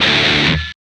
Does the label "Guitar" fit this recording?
Yes